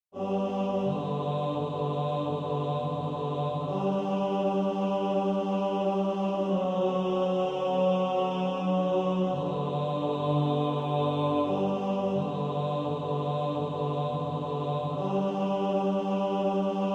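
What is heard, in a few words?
tenor, practice music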